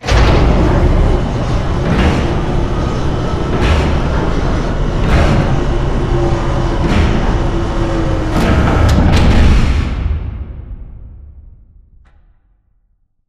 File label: blast shutter raise; Titanfall 2